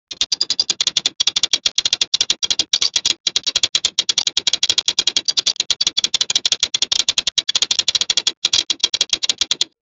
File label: create the sound of a, robotics